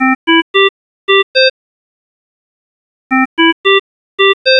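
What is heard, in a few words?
cardiac high, alarms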